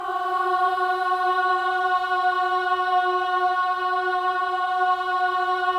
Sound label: BOYS AHS, Symphony of Voices